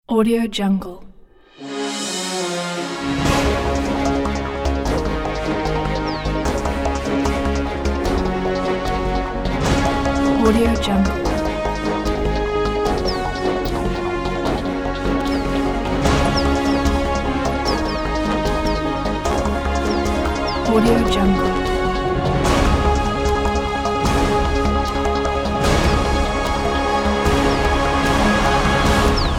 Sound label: sports news ident